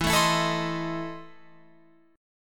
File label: E Major 7th Suspended 4th Sharp 5th E, Guitar, Open F; strummed; 16,16,16,16,18,19